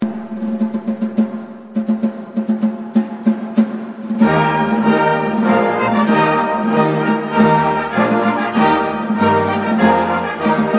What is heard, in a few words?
Marche Bernoise